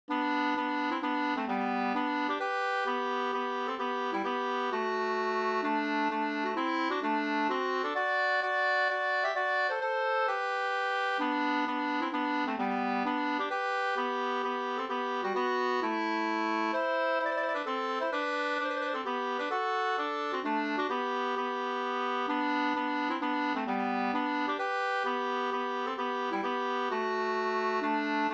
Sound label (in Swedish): hambopolska